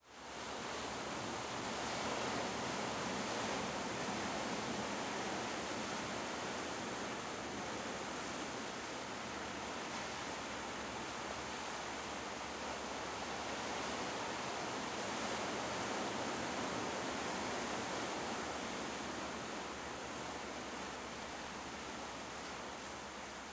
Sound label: Ambience, Seashore, Constant Sea Wash, Cliff Perspective, No Voices, Sunset; Stereo; Sonik Sound Library; Spatial Shores